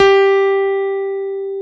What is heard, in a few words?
CP70